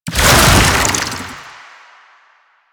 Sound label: Infested Oni Melee End; Warframe